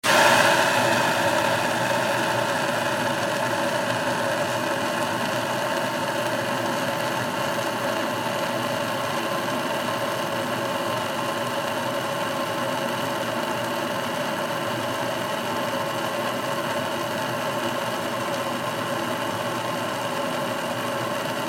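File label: Water Spray Interior Car Steady Hard; Car Wash; Spraying; Big Room Sound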